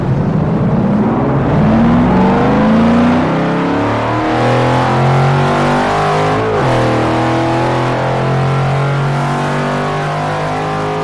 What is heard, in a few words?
Shelby Daytona